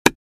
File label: Table tennis paddle ball hit